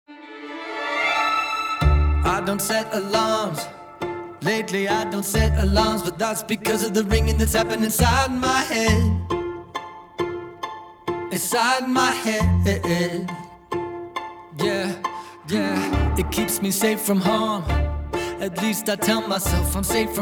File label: string version